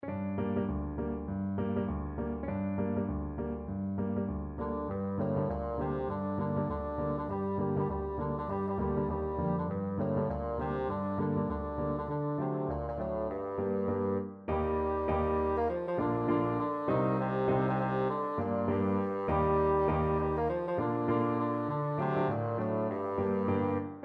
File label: wellerman, bassoon